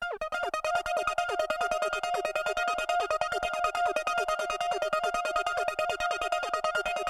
140bpm 303 ping

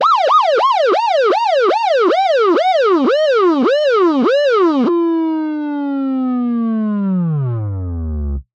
Police Car Siren Go Under

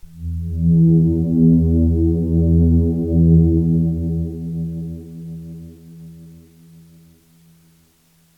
Rise

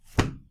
Closing A Book

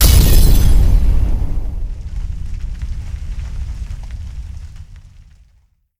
Sweep Explode

